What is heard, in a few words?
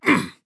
Grunt Jump